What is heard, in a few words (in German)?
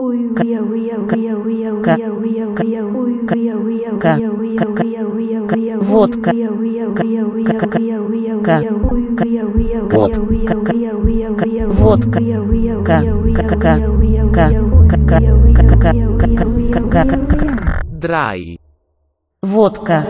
wasser